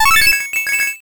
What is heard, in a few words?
jackpot